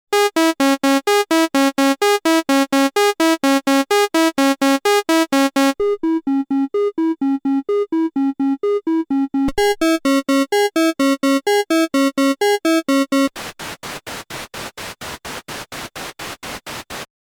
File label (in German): Alle Oszillatoren nacheinander